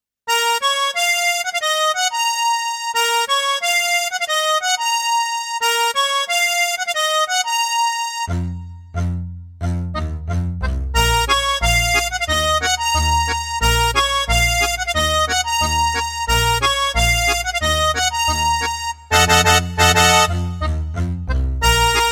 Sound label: Bbm